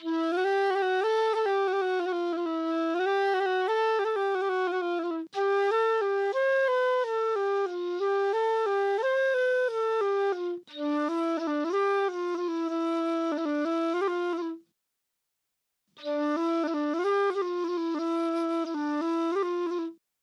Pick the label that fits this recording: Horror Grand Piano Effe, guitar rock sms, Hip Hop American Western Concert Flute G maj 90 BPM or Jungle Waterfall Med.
Hip Hop American Western Concert Flute G maj 90 BPM